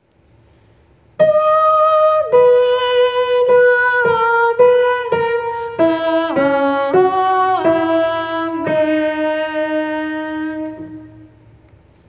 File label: amen tenor